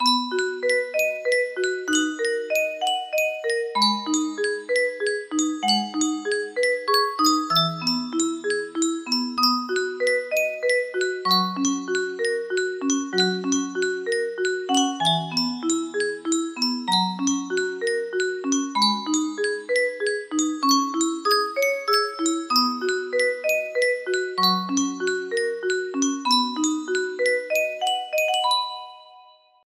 Yunsheng 30 note music box

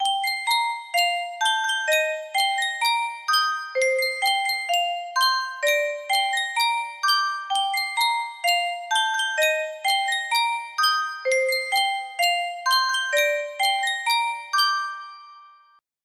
Yunsheng music box

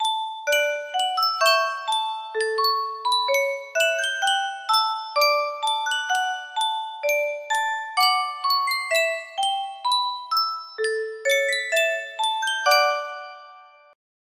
yunsheng music box

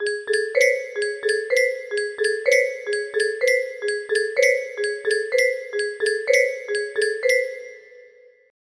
music box

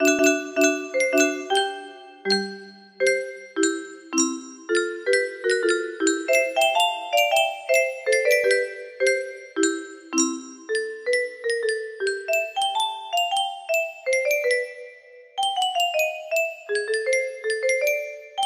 music box melody

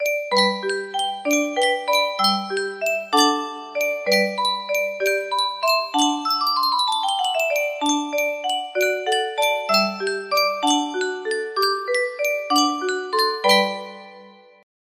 Yunsheng Music Box, music box melody

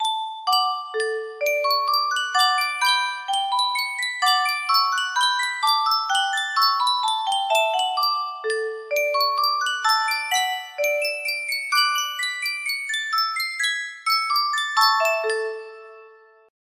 music box; traumerei schumann

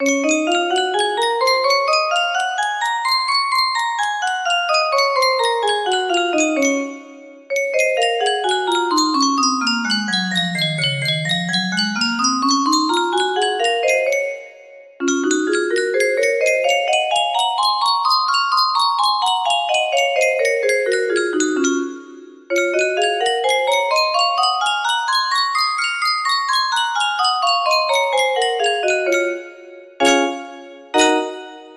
d flat major, music box melody

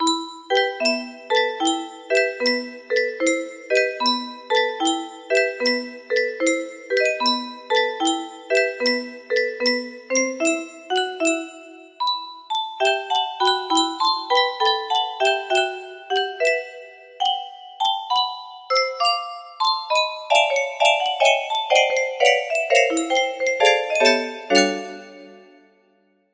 broken music box

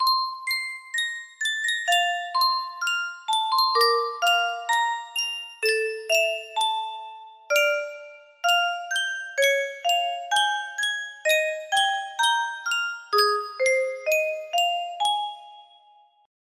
Yunsheng music box; animal crossing new leaf pm800

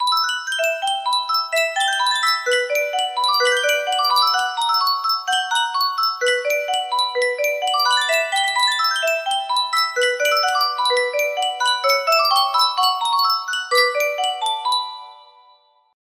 music box; yunsheng custom tune

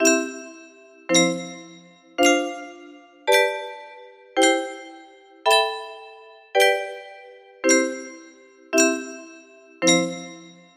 melody, music box

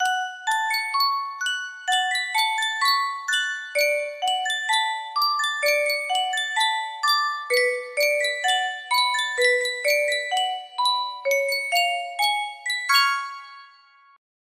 Yunsheng music box, music box melody

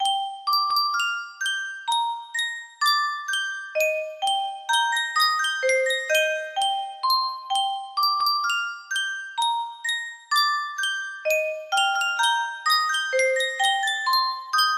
Yunsheng custom tune music box

